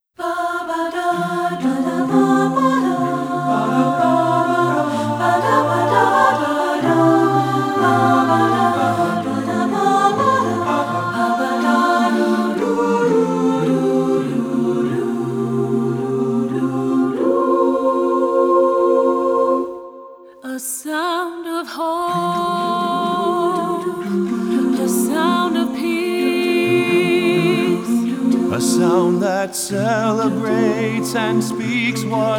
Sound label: A Cappella SATB